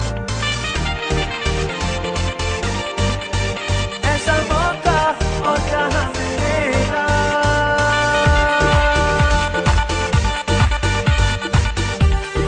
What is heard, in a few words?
Horn Tune; Ipl 5